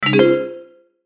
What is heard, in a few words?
CHIME ASCEND; Multimedia; Chimes; Blastwave